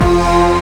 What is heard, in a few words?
SYN TV, Dance Synth; Sound & Vision - Gigapack I CD 2 (Roland)